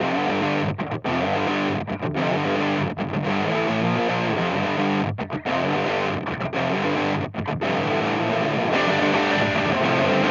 X Drive Rat; Amplitube

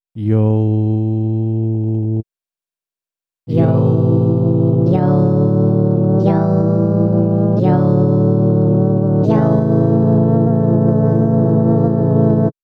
Korg Nautilus Yo Chor

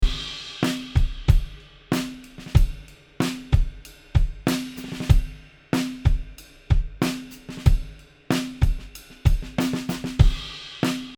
Drums; Glyn Jones Mics; ohne DPA 4060

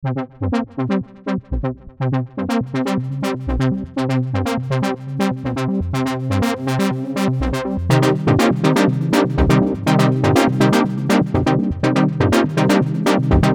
Poly Brass

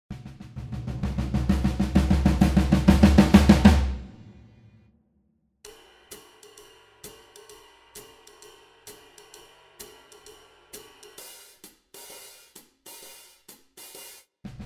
Swing More Drums Grooves

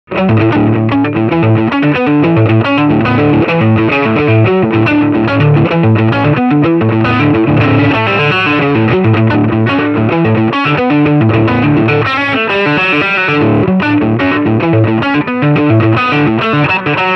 Channel Overdrive

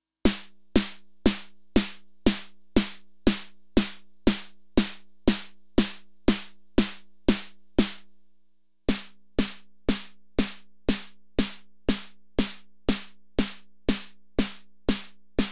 RD-9 vs TR-909 SNARE

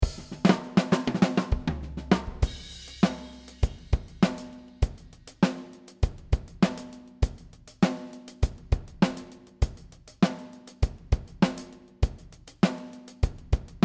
Gretsch Clean Kit; Superior Drummer 3

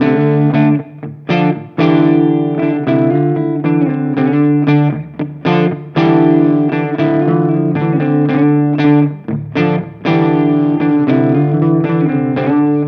Torpedo CAB M 2 Crunch Preamp

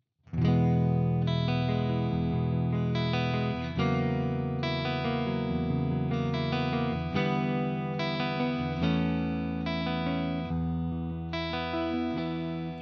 Harley Benton TE 90FLT neck CLEAN

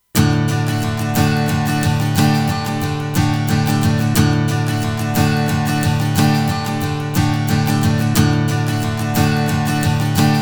Acoustic Guitar Bettermaker

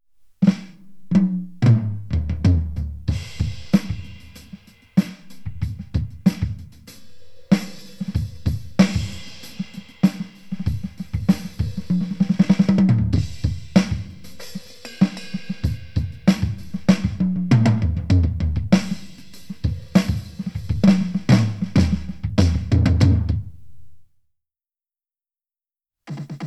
roland pm200

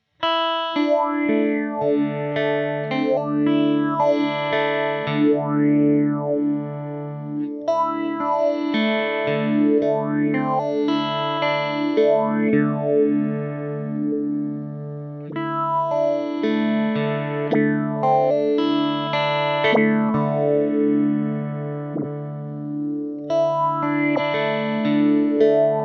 Poly Effects Beebo Phaser LFO Options

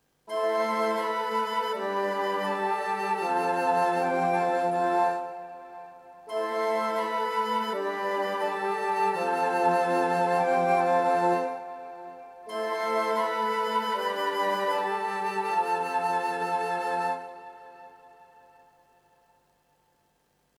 Augmented Woods original; VSL MIRPro3D; Arturia

